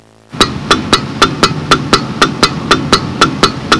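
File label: turn signal; Sound Library 2000; Wayzata Technology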